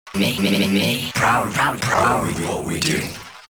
Decoy Bark; Infested Lich; DJ Rom

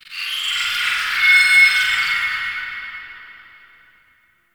High Tech, Ambience; Guild; Warframe; Misc Remaster

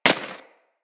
Bullet Impact Debris Snow; Weapons

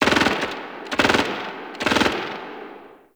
Distant Gunfire; Warframe; Nineteen Ninety Nine

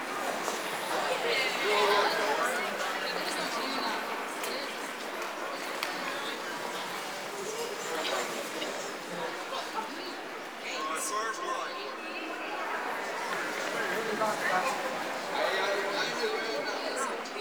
Town Walla Loop; Duviri

